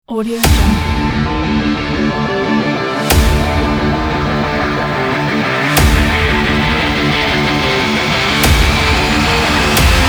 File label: extreme sport rock intro; soundride